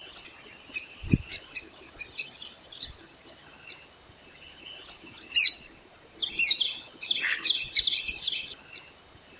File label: Whiskered Treeswift courtship calls, Kledang Sayong Forest Reserve Ipoh Perak Malaysia